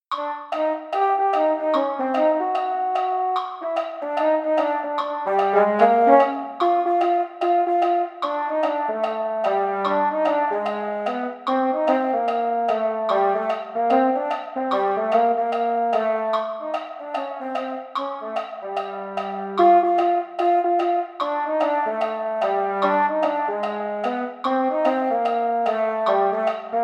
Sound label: mellophones; holiday brass